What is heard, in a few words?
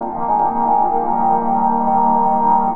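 Pad Synth; Equipped Music; Loungin House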